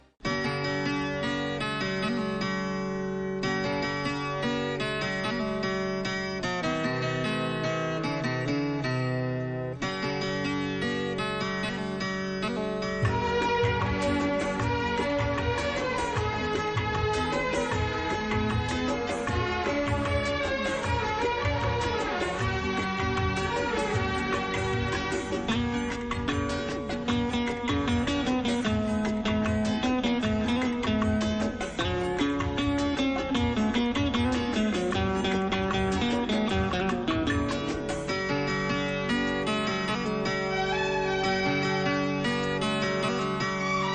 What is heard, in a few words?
Instrumental Tone